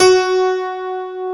PIA OLD, PIANOS

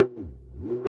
EQ Perc, Percussion Mix

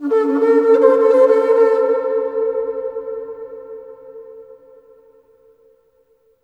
HRNPHR, Heart of Africa; AMB. HRN FX

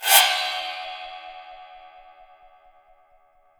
RECO OPEN, BRAZIL

